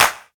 smooth clap single shot g sharp key, Royality free hand clap sound tuned to the G# note.; single hits one shots